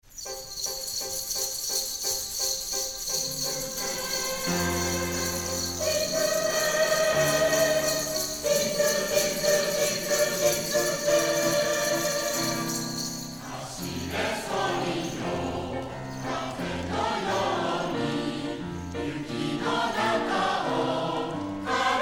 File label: Jingle Bells; ジングルベル J.ピアポイント作曲; 日産自動車合唱団、混声合唱団アプリス、エローラ混声合唱団、エローラ少年少女合唱団